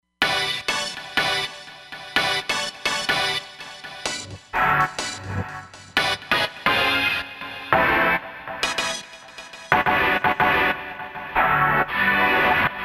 Roland Fantom XR, Patch; BlastfrmPast

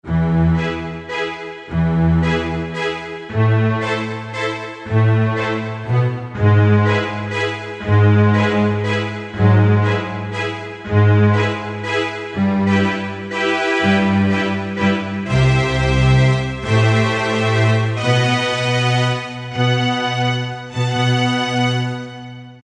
Stringorch, Korg T3

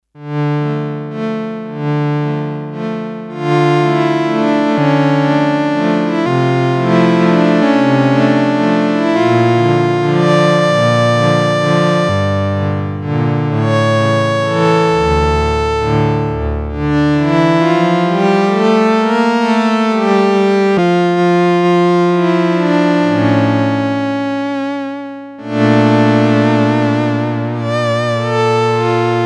Strings, Sequential Circuits Six-Trak; Factory Programs

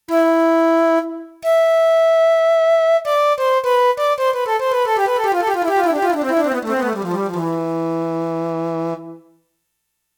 FLUTE, Roland JX-1; Factory presets

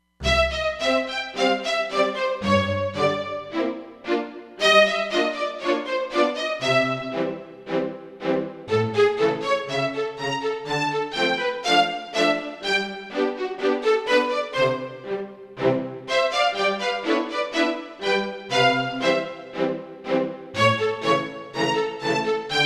So Staccato, Roland Fantom XR

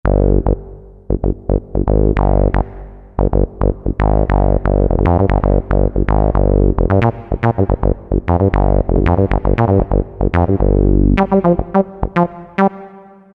Synth Bass 101, Roland SC-50 Sound Canvas; Factory sounds

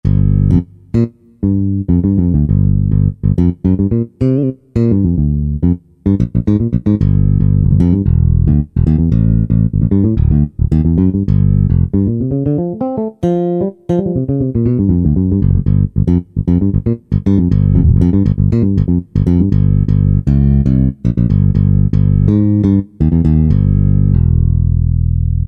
Dyno-Bass, Korg N364; Factory Prog demos

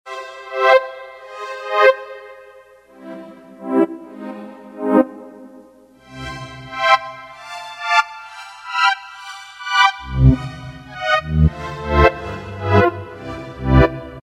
Rev. Echo, Casio CTK-601 Tones

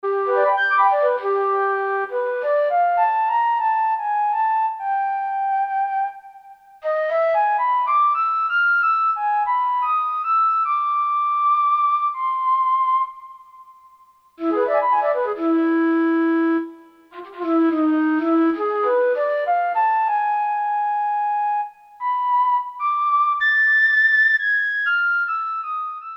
Piccilo, Wind Instruments, Korg DSS-1

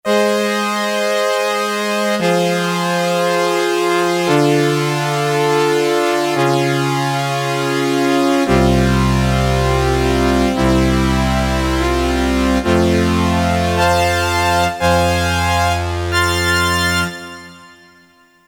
SYNSTRNG; Flutes & Harp

